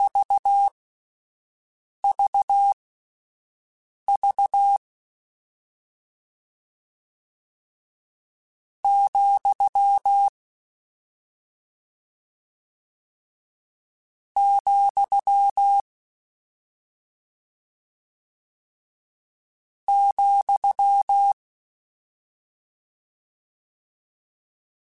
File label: Morse Code, CW Code Groups; Mixed